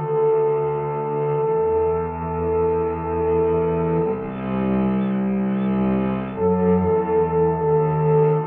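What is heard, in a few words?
strings and horn, Loops, Sad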